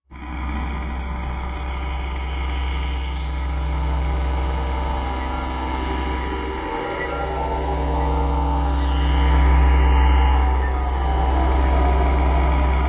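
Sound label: Dragging Around Slow Low, sci fi ambience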